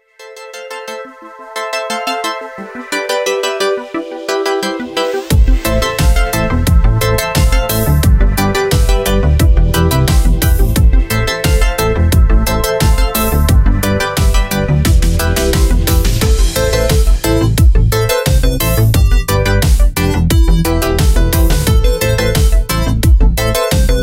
krasivaya muzyka na pianino

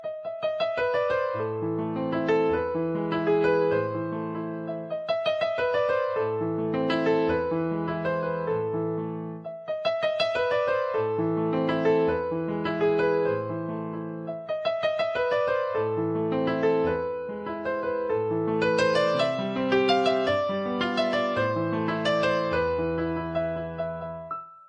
klassicheskaya muzyka pod rington

